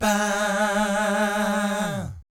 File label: VIB FX, Vocal Planet Jazz & FX; ILIO; JAZZCOMBO